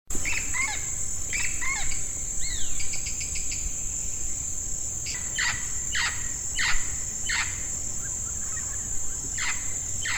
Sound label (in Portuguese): Amazona amazonica Curica